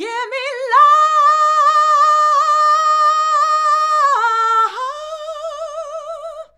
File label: GIMME LOOV, FEMALES, Hyper Dance